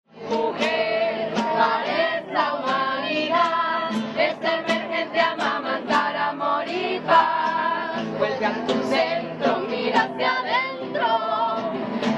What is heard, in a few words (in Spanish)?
mujeres libres de violencia canto